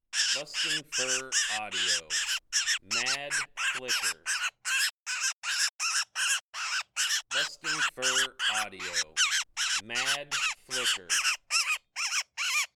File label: Mad Flicker